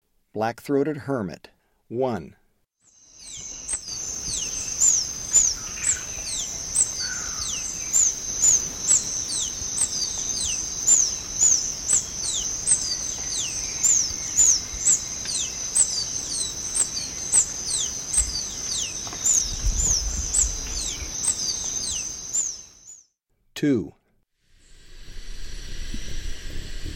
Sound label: Black-throated Hermit; S. America; Eastern Lowlands